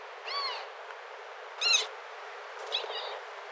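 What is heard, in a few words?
Equus przewalskii juvenile; Equus prjewalski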